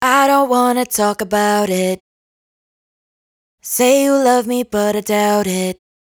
hook 128 F#m, Dry Vocals; Raw Vocals; Talk About It; Slate Digital Omnivox Vocal Sample Pack

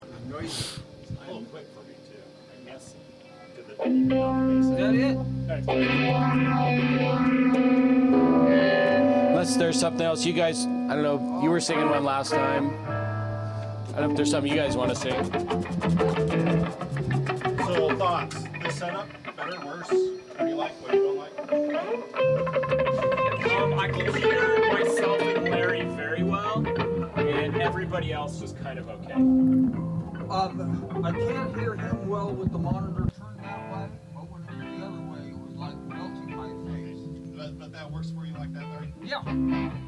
Background Noise Mix

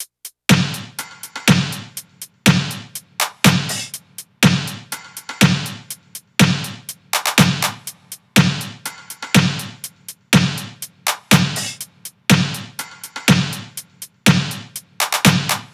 top 122, Drum Loops; SD MIDNIGHT Sample Pack; Slate

